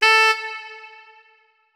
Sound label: notes, saxophone; Hideout Furniture